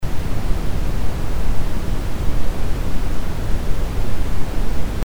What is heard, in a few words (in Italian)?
Rumore Rosso